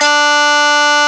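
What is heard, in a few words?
Overdrive Guitar D2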